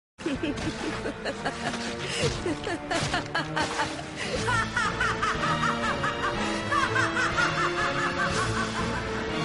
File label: evil laughter